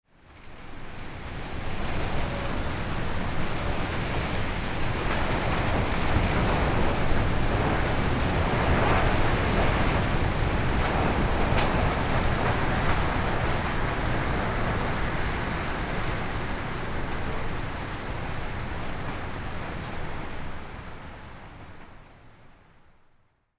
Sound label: wind gust